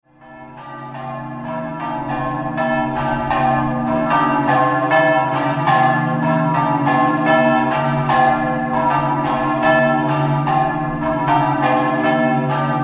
PAVIA DI UDINE, campane

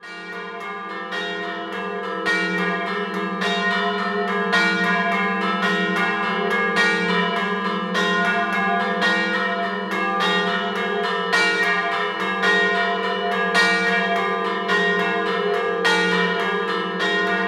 Canussio Scampanottata, campane

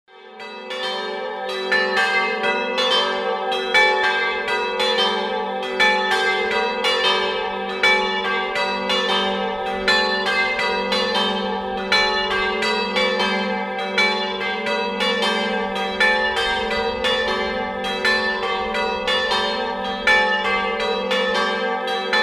mione, campane

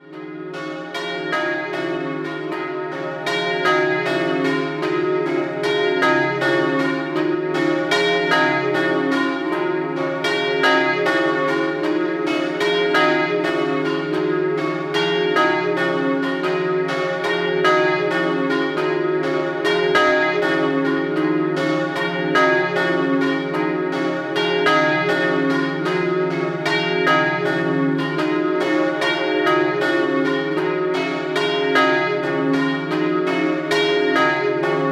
SAMMARDENCHIA DI POZZUOLO, campane

